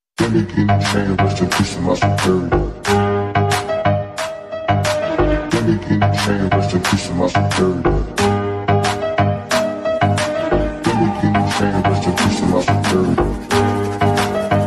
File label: REMIX RINGTONE, TRAP